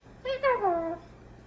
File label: peekaboo, eclectus parrot; Baxter